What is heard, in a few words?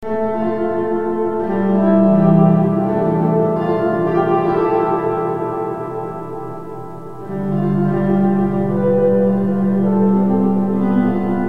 water